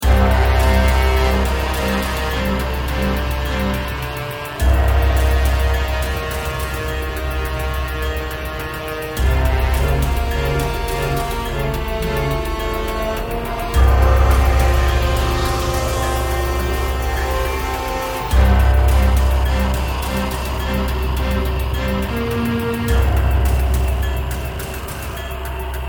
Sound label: menu music; Dead Trigger 2